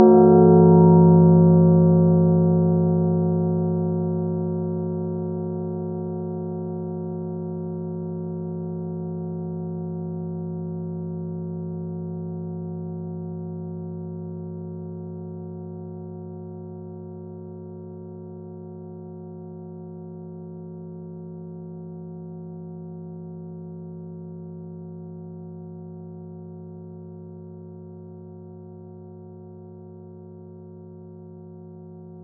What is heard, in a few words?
synth; sound effects and sound reinforcement